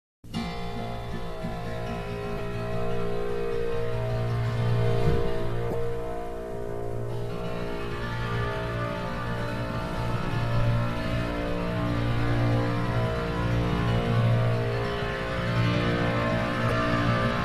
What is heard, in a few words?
Somachord